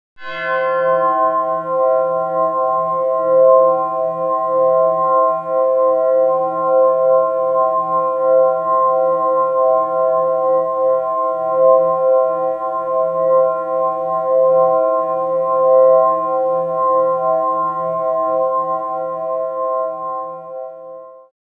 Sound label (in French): exemple bells; physical music; time freezer